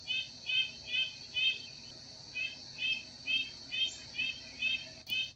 Red breasted Nuthatch

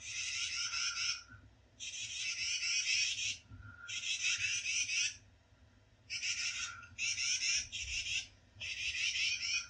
Tufted Titmouse

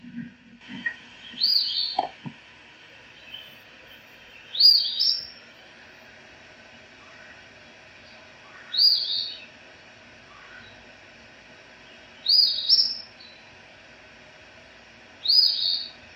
Eastern Phoebe

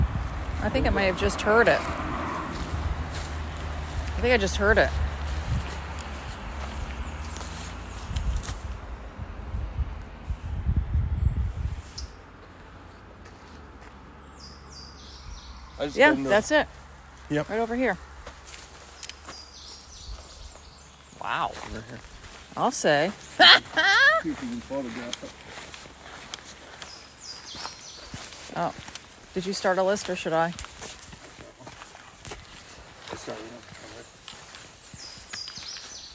Louisiana Waterthrush Live Action

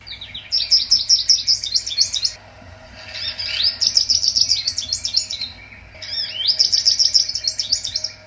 American Goldfinch

